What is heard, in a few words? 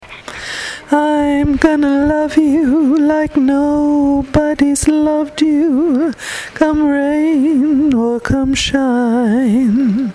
vocal